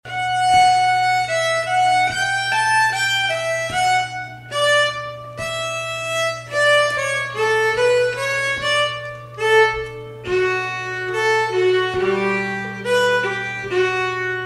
Polska fran Sormland (slow); efter Pehr Ericsson (Leffler 53); slangpolska southern

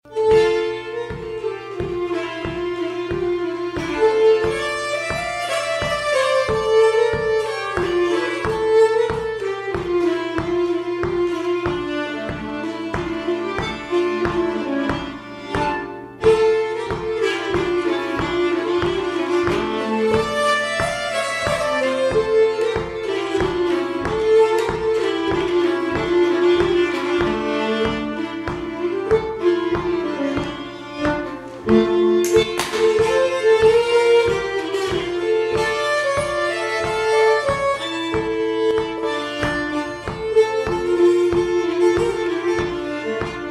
Polska fran Sormland; slangpolska southern

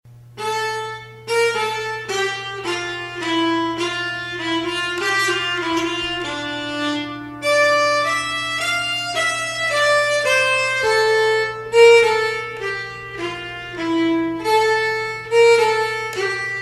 Polska fran Sormland (slow); slangpolska southern

